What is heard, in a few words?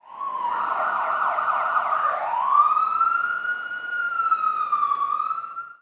Ambulan; Buggy; PS1